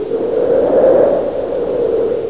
cloud; My First Encyclopedia & Spider-man Cartoon Maker; brushes

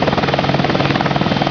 Chopper; Hostile

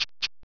chika; Tesserae Demo

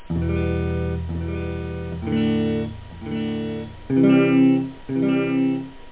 Guitar, delay; Meeting Pearls III

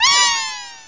tune whee; The Epic Collection 3; Epic Marketing; muzak samples

